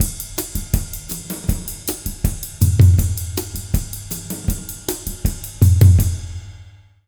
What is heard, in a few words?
160, Jazz Latin Drumloops; USB Soundscan vol.08; AKAI